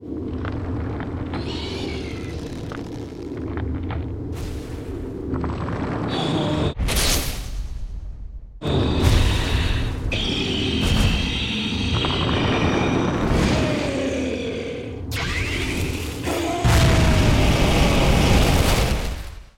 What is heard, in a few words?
Appear